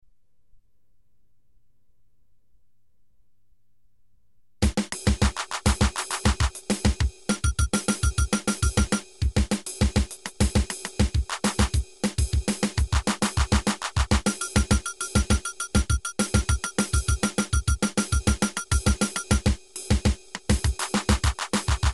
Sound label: midi